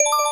count Up Loop; berry burst

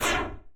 lazer, shot

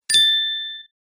click right; abcs; letter m

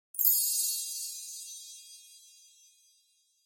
PRODUCT REVEAL

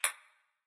Bullet Bounce

